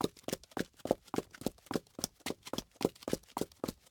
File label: Footsteps Pumps; LOOPERS